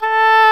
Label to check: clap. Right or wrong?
Wrong